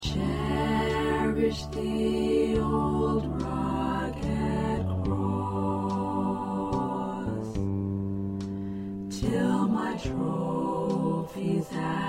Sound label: Chorus